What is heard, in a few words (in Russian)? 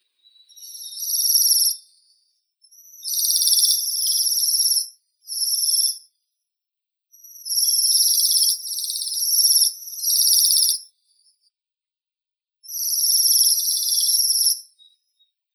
sviristel obiknovennii bombycilla garrulus, zvuki prirodi